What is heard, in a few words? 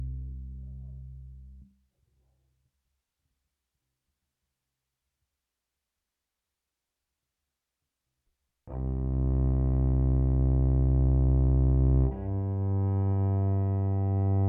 pickup direct